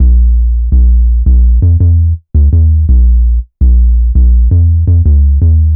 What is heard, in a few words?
Hard 808, Bass Lines 166.5; Jungle; Zero-G Jungle Warfare 1, 2 & 3; 90 s Sample CDs